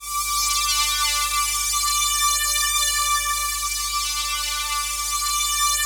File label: PAD, Dance Mega Synth